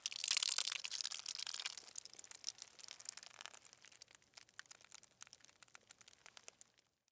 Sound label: Blood Squirt Spill; Haunted Bloodlines; SFX Producer